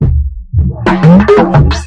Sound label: chompy groove fill; Weird and Interesting Hits; single hits